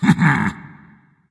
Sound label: hehheh dragon; fafnir